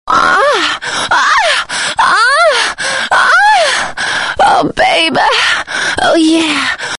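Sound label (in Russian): zvuki zhenskikh stonov, female moaning sounds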